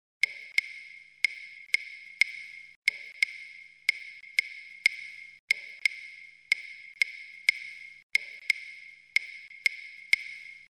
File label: clave son 2-3